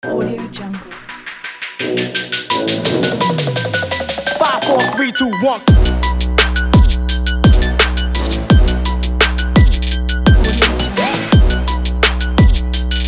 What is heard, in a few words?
action workout sport trap background